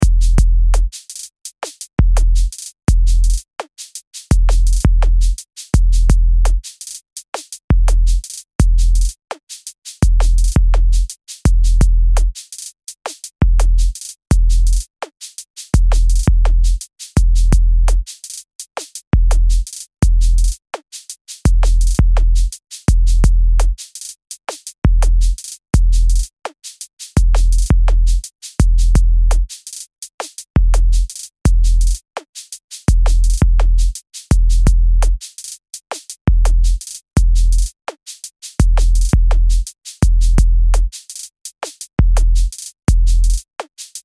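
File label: beat 84BPM, Loops und Beats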